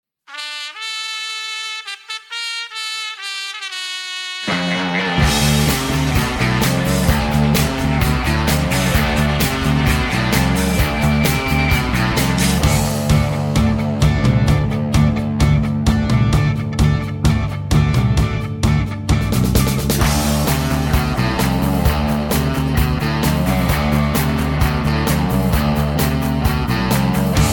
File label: (D) No Backing Vocals